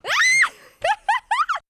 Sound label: girl screech laugh